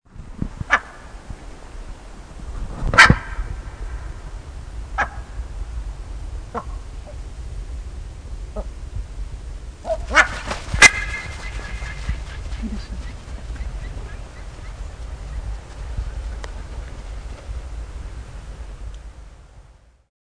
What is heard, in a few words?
ibis